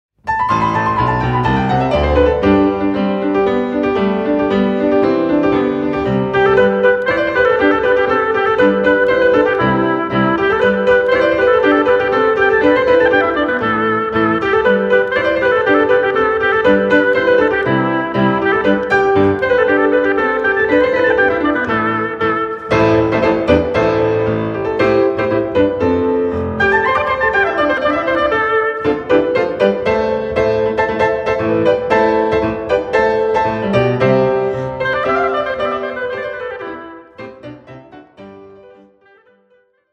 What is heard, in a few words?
Hornpipe; New Hampshire Hornpipe; L'Oboe nel Grande Schermo